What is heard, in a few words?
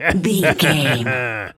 voice fun man character deep laugh